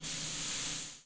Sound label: Air, train; OpenBVE